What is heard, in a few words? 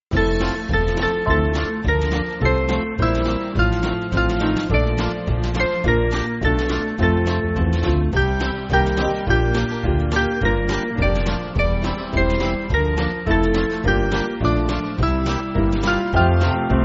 Band, Free Music Backing Files for Churches; O for a thousand tongues to sing